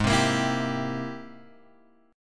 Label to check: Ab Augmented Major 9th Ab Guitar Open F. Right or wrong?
Right